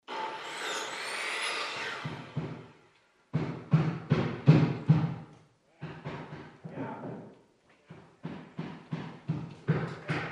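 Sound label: CONSTRUCTION & FACTORY Background RESIDENTIAL: INT: Carpenters building house, distant hammering, dropping of materials, low level voices, circular saw.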